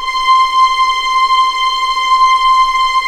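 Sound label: C6 legato piano, violins; East West; The Ultimate String Collection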